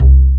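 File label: double BASS C-2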